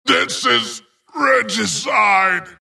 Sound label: death long; dota; skeleton king